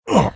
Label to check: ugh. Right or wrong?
Right